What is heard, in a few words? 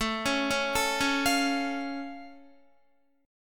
A Augmented A down Guitar; A+ arpeggiated